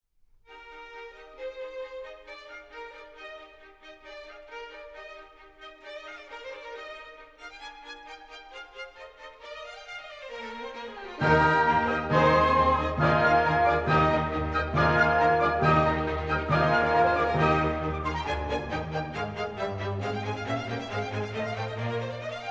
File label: Concerto in E Flat Major, Allegro